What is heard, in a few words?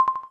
Xylophone